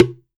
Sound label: Plastic Box, household rhythm samples Hits